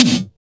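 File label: SDS V SNR, snare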